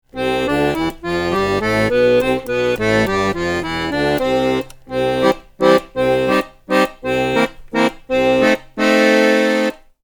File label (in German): tonleiter bass beyerdynamic eq